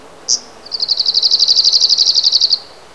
Phylloscopus borealis

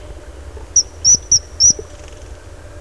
Alcedo atthis

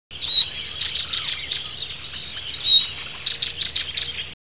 Acrocephalus dumetorum

